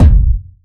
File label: tight lows kick drum sample c key